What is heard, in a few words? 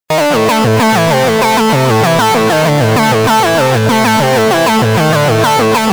riff